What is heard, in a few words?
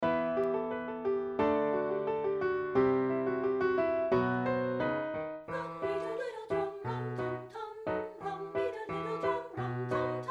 DRUM RUM TUM ALTO, satb; partbypart